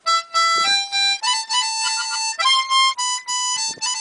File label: Harmonica